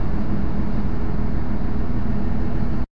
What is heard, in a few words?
hover idle; ufoai